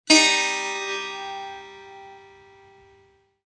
setar mi b

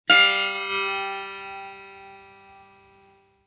setar fa

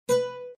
oud si

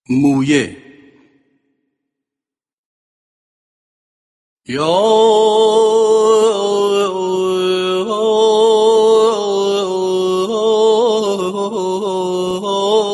moyeh, seghah

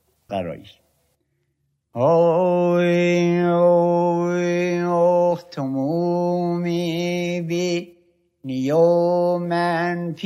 gharae, afshari

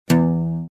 oud do

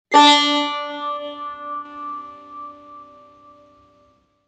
tar re

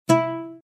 oud la

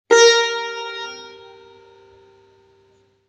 tar si

